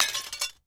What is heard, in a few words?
impact glass shard drop